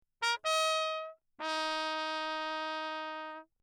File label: TRP MUTE Bach; Perinétventiltrompete